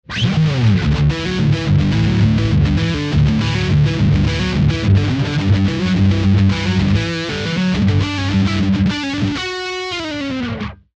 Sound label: EMG 60 neck dirty